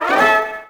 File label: Pickup